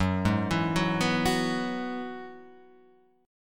Gb 7th Suspended 2nd Suspended 4th, Guitar, Half Step Down; Gb7sus2sus4 arpeggiated